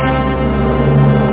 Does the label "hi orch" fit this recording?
Yes